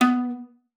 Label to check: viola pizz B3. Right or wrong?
Right